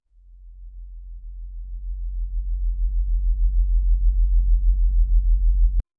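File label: cello bow on metal that, drone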